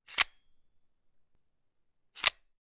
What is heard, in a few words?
gun 1911; weapon; still north media